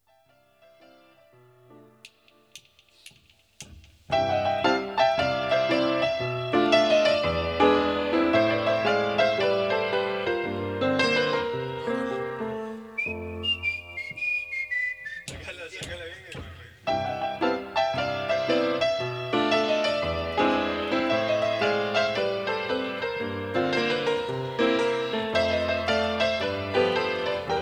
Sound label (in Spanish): Pianito